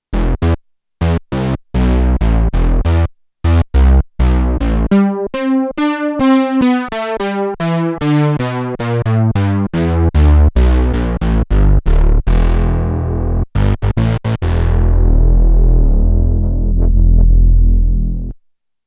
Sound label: BASS II, Korg DW-8000; Factory Patches